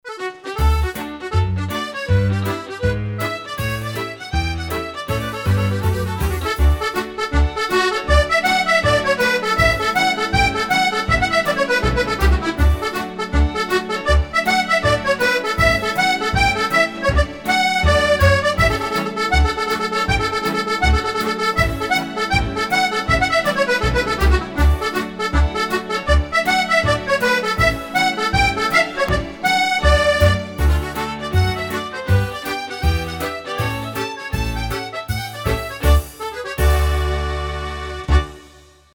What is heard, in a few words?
HORNPIPE, accordion